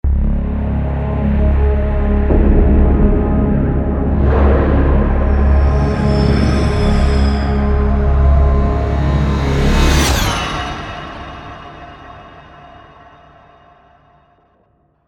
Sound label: Horror Music